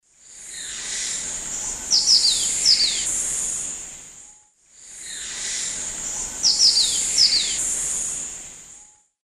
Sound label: Myiopagis caniceps, call; S. America; Eastern Lowlands